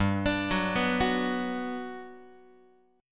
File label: G Major 7th, G, down, Guitar; arpeggiated; JGuitar